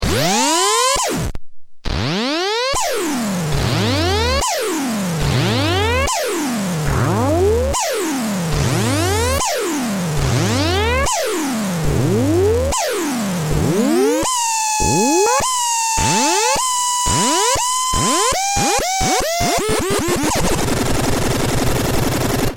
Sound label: Propeller Lead, HardSID